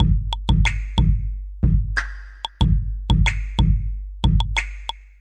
crunchy empires hiphop, drum loops and breaks, 92 bpm; 091 to 100 bpm